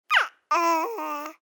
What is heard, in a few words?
Baby Babble